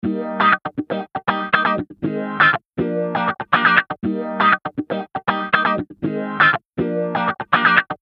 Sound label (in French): guitare wah